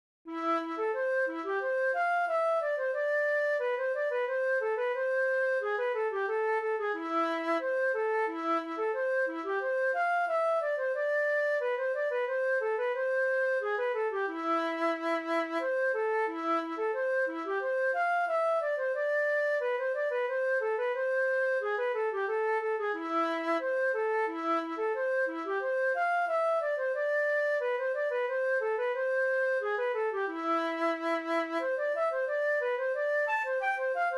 fandango flute; betelu

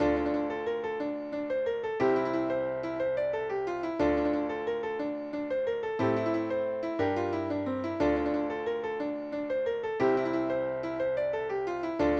jig; ful tae the heid o troots

